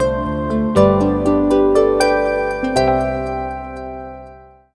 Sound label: Meditation, notifications; Explay Golf